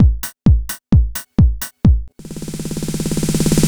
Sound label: Drum Loop 130; Audacity; loops